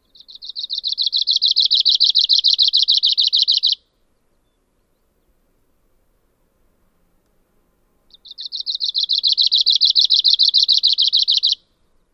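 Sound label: song, bird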